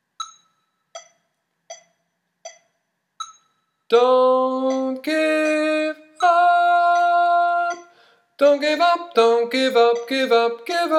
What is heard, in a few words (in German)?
Endteil Sopran oktaviert